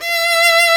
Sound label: STR SOLO, String Master Series, Chamber Grp; Roland - String Master Series; Chamber Grp1